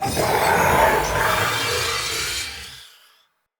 Vassal Laser Charge; Enemy; Characters; Abilities; Mendicant; Mnd King

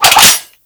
Grineer Lever Action Pistol Cock; Weapons

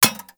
Bullet Impact Generic Metal; Warframe

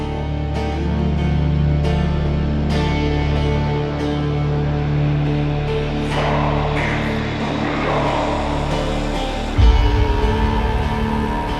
Find Page Cue; Entrati; TennoCon 2023; Cinematics

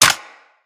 Weapon Jam Hit; Weapons; Grineer

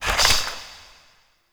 Shot Mech; Warframe; Noctua; Weapons

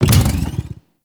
Rev From Stop, Motorcycle; Warframe; Vehicles; Motorcycle Ninety Nine

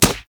Melee Fist Impact Generic; Fists

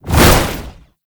Transition State Foley; Warframe; Tau; Roathe

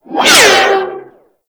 Energy Proj By

